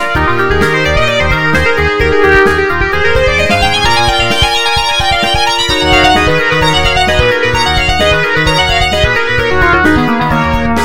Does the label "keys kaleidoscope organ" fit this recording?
Yes